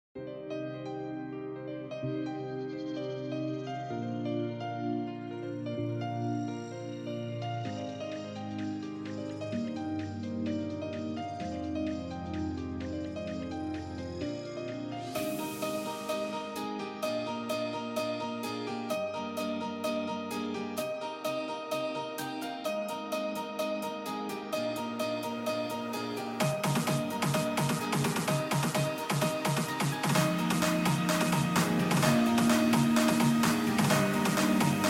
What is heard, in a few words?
Karaoke House; The Sims 4 City Living; macOS; PS4; Windows; Xbox One; Xbox Series X/S